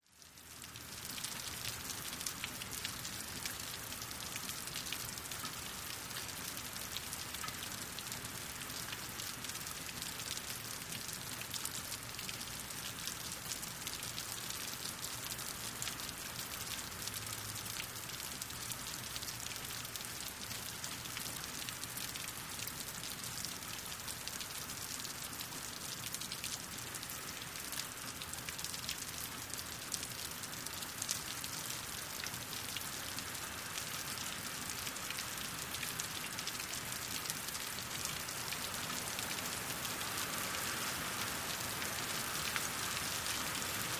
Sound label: Rain Water Drips; raindrops, splatty